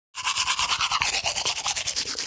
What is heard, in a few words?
Toothbrush cleaning teeth brushing teeth short